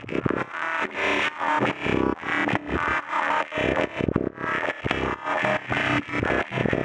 Xenon Grid Loop E 140 BPM, Psytrance; Grid Loops

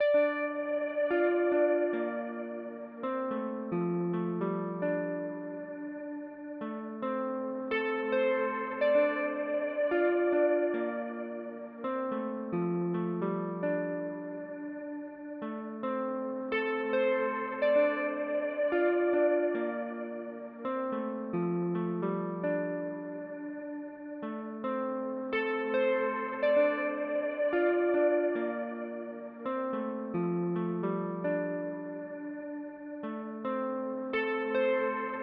102 BPM G Min Guitar, Afrobeats; Melody; Loop Stems; Cymatics; Know You

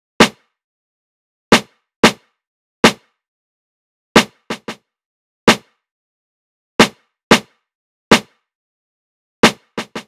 Drum Loop - 91 BPM Snare, Boom Bap; Dreamers; Cymatics - ANALOG EVOLUTION Beta

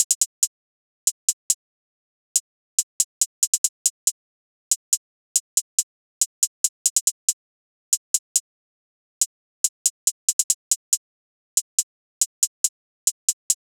Hihat Loop 140 BPM; Pass Go

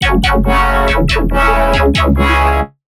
Melodic Bass F# 140, One-Shots Bass Hits; Fractal; Ghosthack; Color Bass Essentials

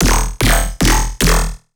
Bass Shot E, Dubstep Basses; One-Shots; Squiddim; Ghosthack Advent Calendar 2021 - Day 16 - Dubstep Basses

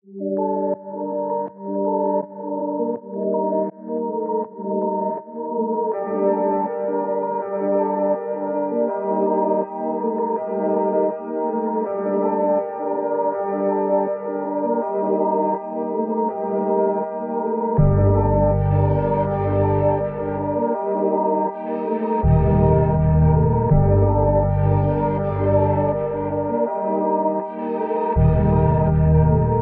Trauma - 162 BPM G Min, Melody; Cymatics; Cymatics - 2022 Melody USB Expansion

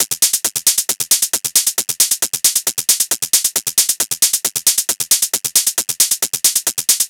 Hat Loop 135, Techno

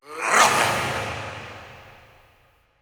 Processed Battle Shouts, Viking Vocal

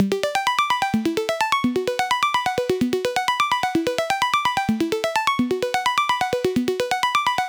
Arp Loop 128 BPM B min, Future Bass, Synths

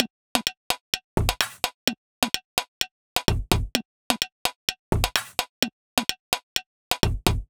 Perc Loop, 128 BPM; Drums; Loops; Top; Cymatics; EDM Starter Pack

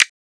Percussion; Cymatics; Eternity Sample Pack; Drum One Shots

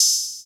Open Hihat - Sizzle; Drum One Shots

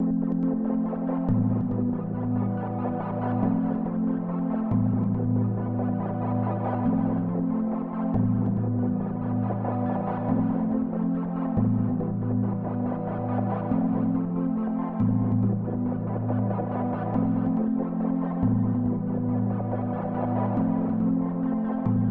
140 BPM A Min Pad, loop stems; Cymatics - 2022 Melody Collection - Wavy; Vapor

